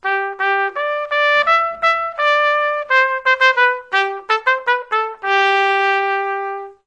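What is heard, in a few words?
trumpet twinkle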